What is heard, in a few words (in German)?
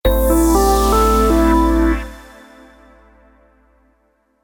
Soundlogo; Elha Service